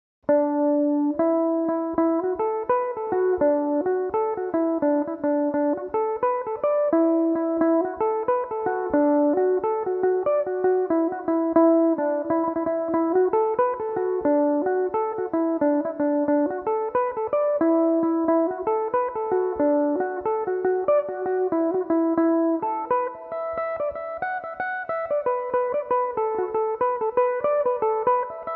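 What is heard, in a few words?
E dorian, irish mandolin, tunes; flowers of spring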